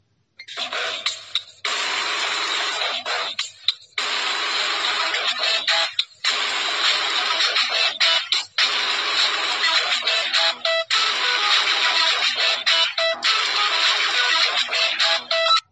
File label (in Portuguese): motorola estourado